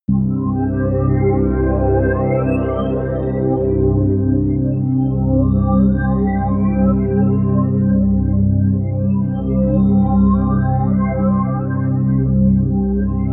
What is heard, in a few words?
pad sweep underwater; flaechen